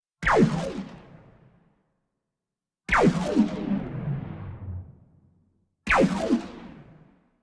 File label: laser gun